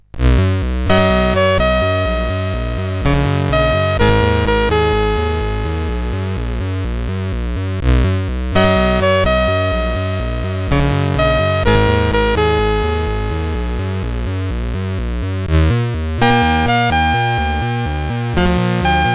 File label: News intro, CSG8580R5